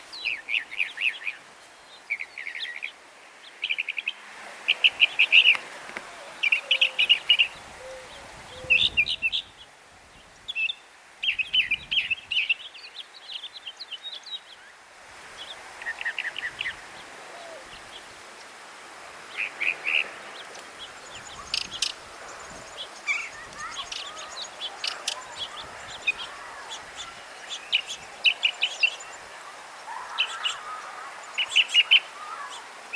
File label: Northern Mockingbird Mimus polyglottos; Goltz